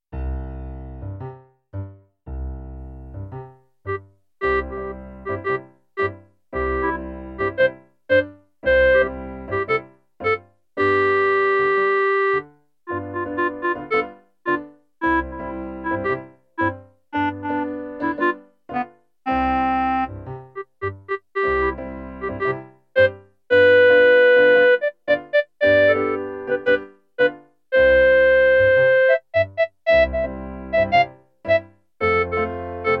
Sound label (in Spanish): CLARINETE; Habanera